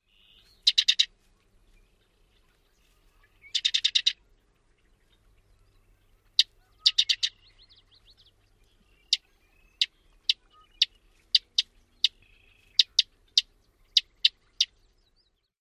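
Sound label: calls, Sedge Wren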